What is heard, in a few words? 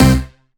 Synth Shot; Vengeance Samples